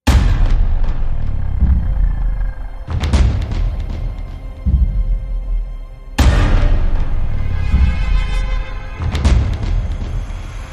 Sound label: No Guitars